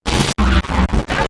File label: Gritty Vox Ripper